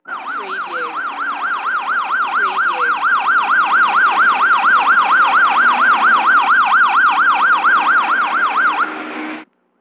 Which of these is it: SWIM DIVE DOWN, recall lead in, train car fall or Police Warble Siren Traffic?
Police Warble Siren Traffic